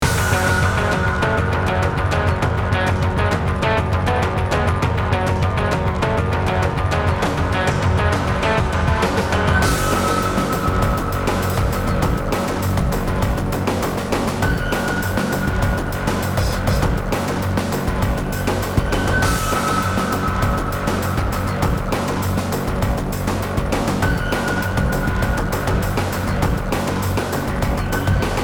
Exploration Hardfight

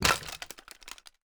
wood break

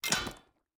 Wall Stick; Tower Fortress; Android

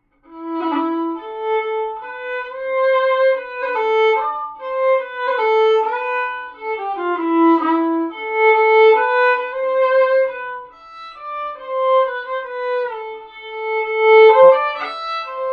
Violin; assassin s creed syndicate

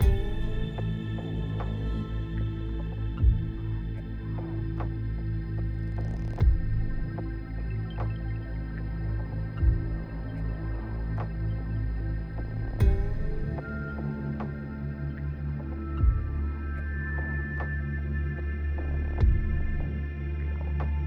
Ambience 75BPM

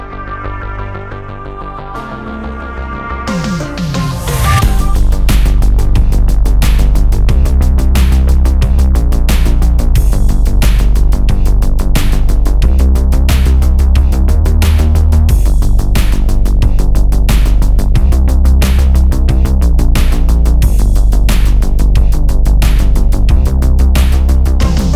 Bonus Background Music; Itadaki Smash

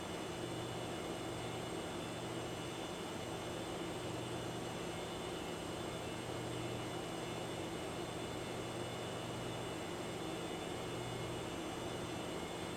light computer; teardown; ps5 windows xbox series xs

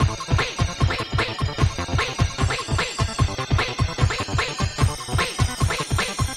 Cartoon

